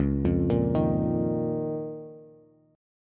Db 6th Add 9th Db down Bass; Db6add9 arpeggiated; JGuitar

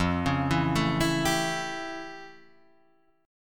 F Augmented Major 9th F

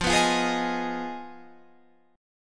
F Minor 7th Flat 5th F down Guitar Half Step Down

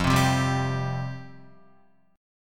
F Suspended 2nd F Guitar; Fsus2 strummed; JGuitar

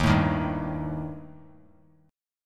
D sharp Major Flat 5th; strummed; D#Mb5; JGuitar

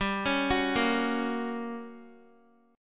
G Minor Major 7th Flat 5th G, Guitar; arpeggiated

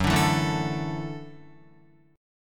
Gb Minor 7th Sharp 5th, Guitar; strummed; JGuitar

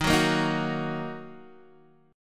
D sharp Minor, Guitar, Open D

